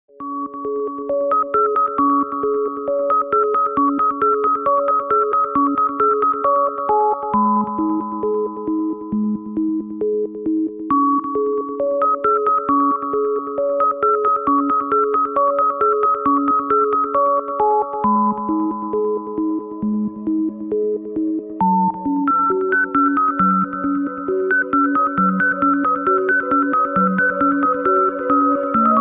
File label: remix